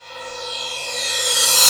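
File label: crash reverse, Dance Mega Drums; Best Service; Dance Mega Drums CD2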